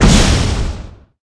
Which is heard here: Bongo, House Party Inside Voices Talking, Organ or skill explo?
skill explo